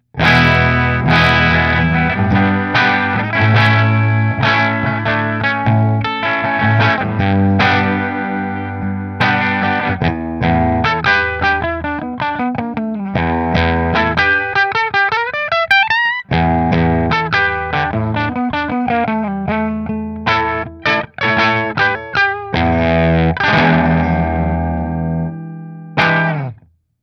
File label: McNaught D Position 5 Fender, guitars